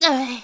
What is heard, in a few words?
Freeze; Link getting frozen; zelda